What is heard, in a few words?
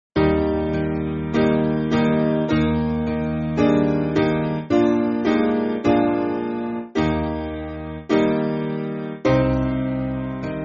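Piano, Free Music Backing Files for Churches; Now From The Altar; Naomi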